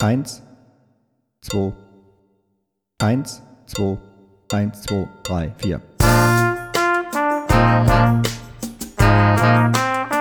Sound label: Tp Solo